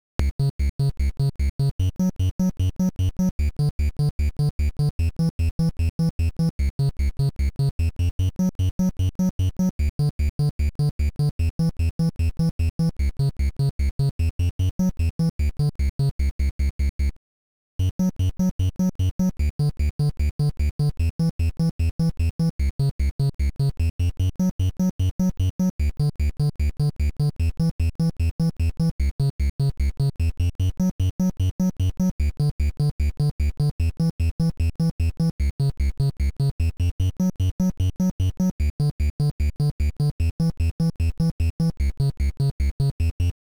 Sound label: bass; Ninja gaiden 15